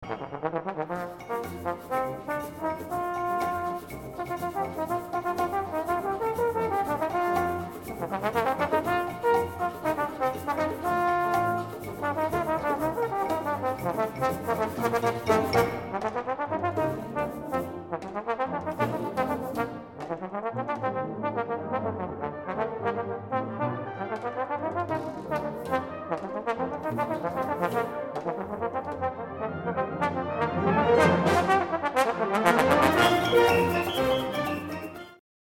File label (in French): extrait trombone